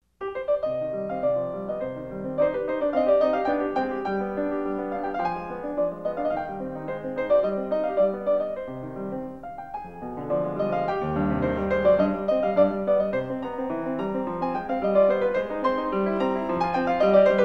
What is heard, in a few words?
Piano Sonata in C minor